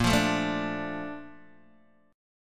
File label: Bb Major 7th, Bb, down, Guitar, DADGAD